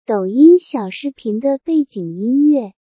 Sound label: chinese tiktok douyin video background music